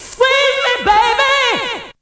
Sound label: VOC